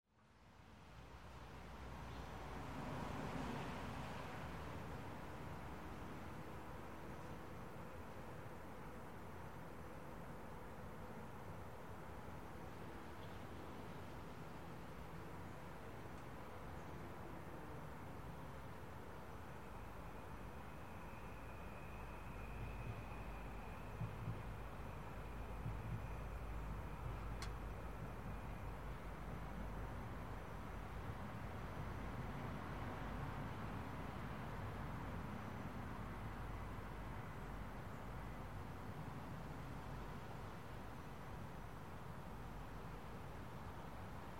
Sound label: morning ambience